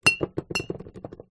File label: foley glass bottle down on dirty table with others